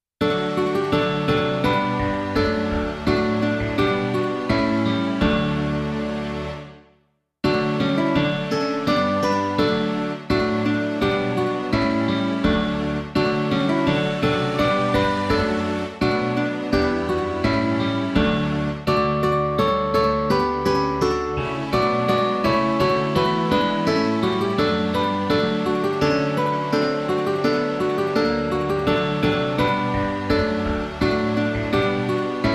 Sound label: midi